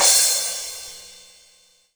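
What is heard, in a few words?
cymbal, left; Best Service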